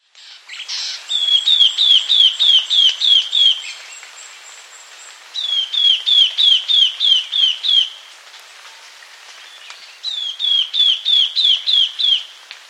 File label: Ashy Antwren, Nature's Songs, S. America